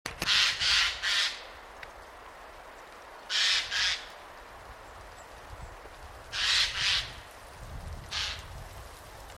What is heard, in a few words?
Garrulus glandarius; SETE